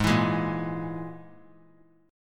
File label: Ab Suspended 2nd Sharp 5th Ab down; strummed; JGuitar